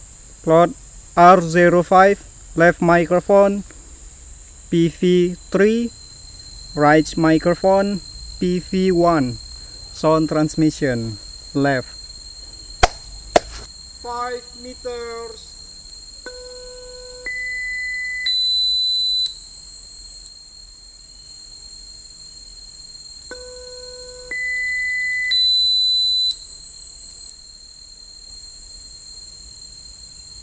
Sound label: birds